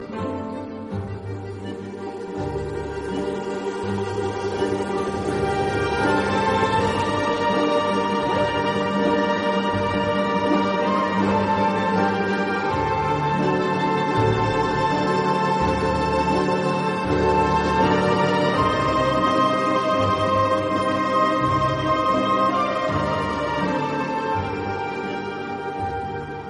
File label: russian orchestra